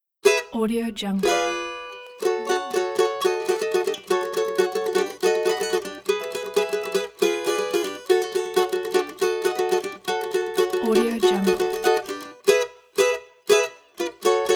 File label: original cavaquinho brazilian samba groove